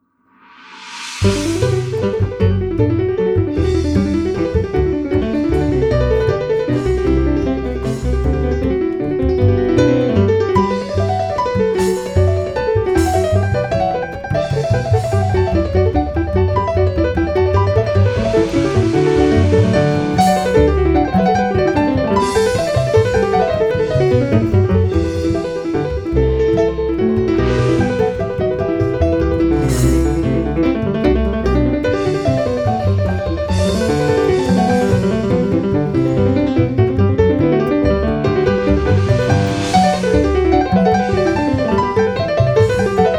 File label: Variations, for keyboard